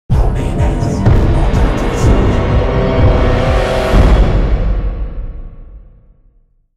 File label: Fight Fail; music; Louvre; The Da Vinci Code; XBOX